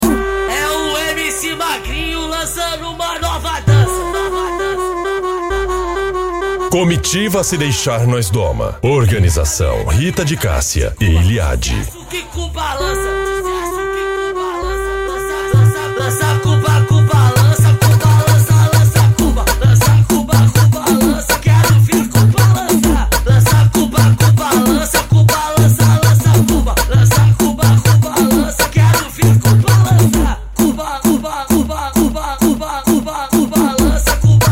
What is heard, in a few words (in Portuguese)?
funk bass